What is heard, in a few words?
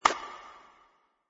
break Apart; molecules and light; greenhouse effect